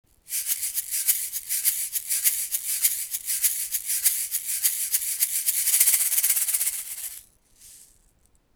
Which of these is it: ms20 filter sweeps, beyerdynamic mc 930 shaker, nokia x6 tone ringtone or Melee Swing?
beyerdynamic mc 930 shaker